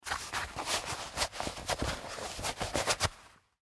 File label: Gloves Leather Put On Hand; Dressing; Industry; Sound Effects:Human; Blastwave